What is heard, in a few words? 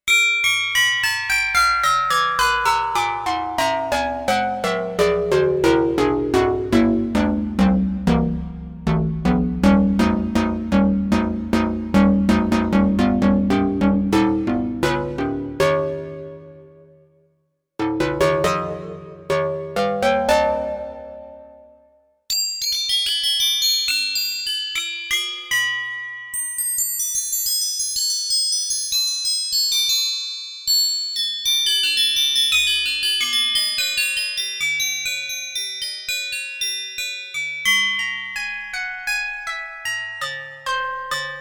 Cross Modulated Clangorous Bells